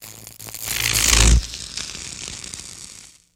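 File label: Electric Arc Sizzle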